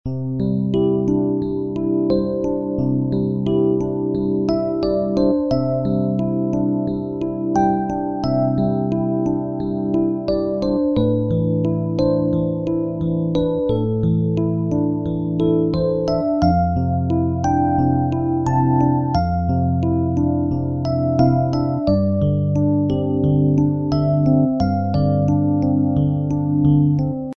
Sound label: BGM